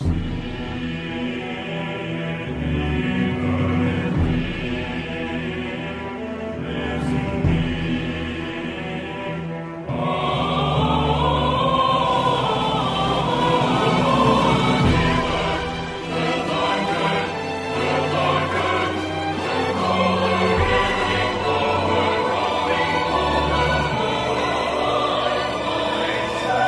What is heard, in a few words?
voice, Sad